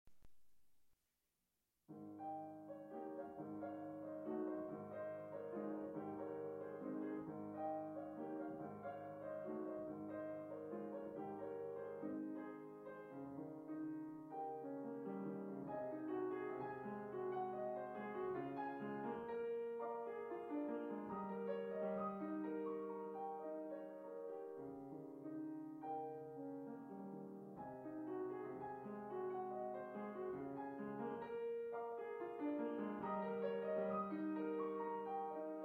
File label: Piano; Brahms Waltz Op 39 No 1